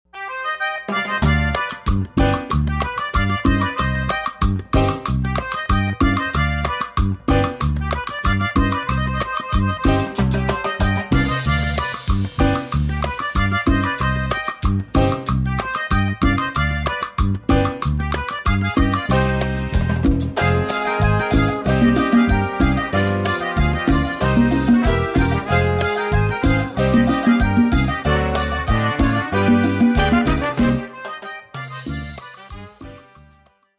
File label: Cumbia; honduras musical 9no grado